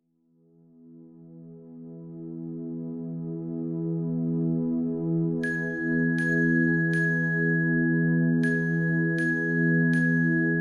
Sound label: Jingle Bells (Lullaby Version)